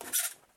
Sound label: Rusty Spring